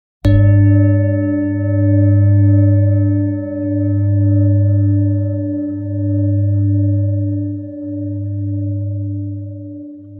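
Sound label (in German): Planetenschale Merkur, Ø 26 cm, 1800-1900 Gramm inkl. Klöppel; Sound-Spirit Shop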